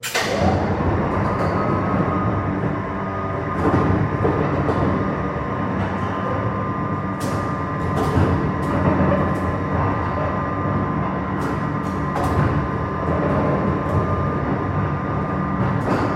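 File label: anchor sounds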